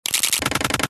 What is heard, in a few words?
Detailed Mechanical Morphs Mutations; PMSFX